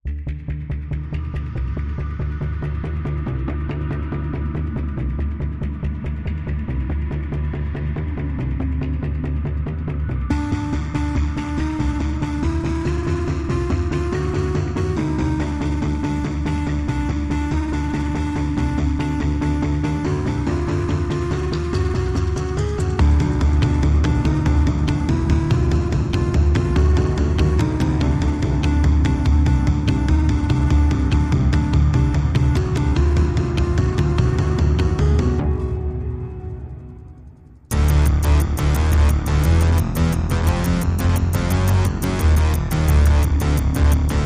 Pads and Pulses Only

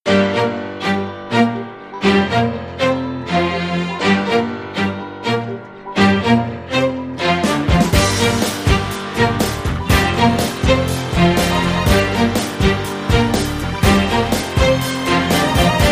Rock Feel

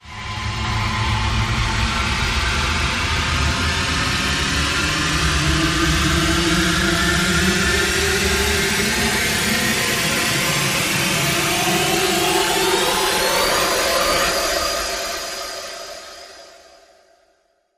Rise Long Airy Ending

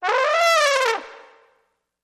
Trombone Elephant Call

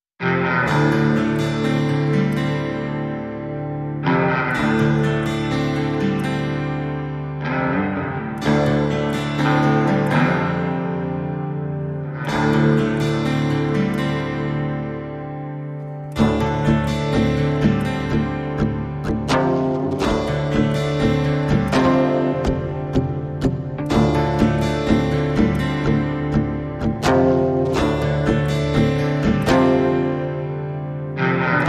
Guitars Only

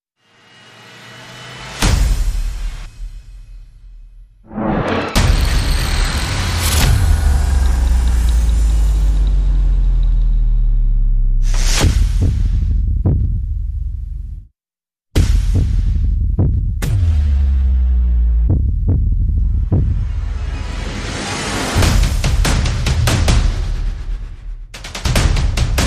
Percussion and FX

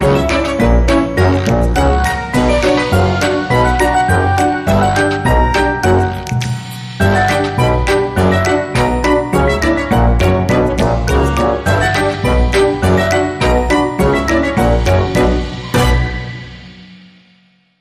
Elephant March

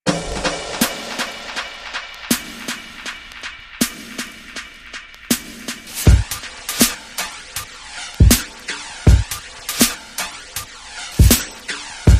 Strumming Beat

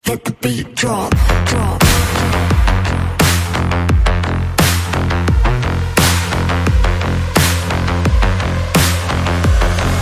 no lead synths

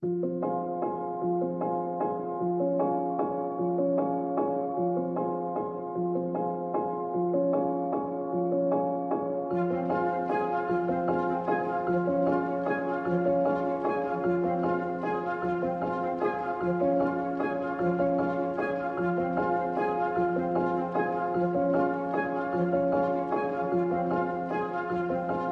No Cello No Vocal

